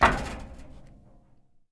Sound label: sprint metal; Escape from Tarkov